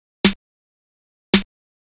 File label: Snare, Trance